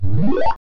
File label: Login Sound; Xfire